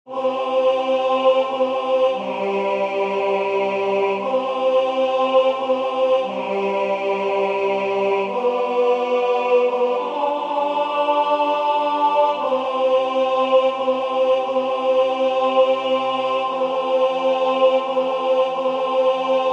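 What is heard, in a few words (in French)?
tenor, Musique sacrée; Douce nuit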